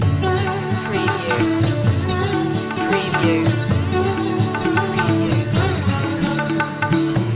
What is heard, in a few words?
delhi stomp loop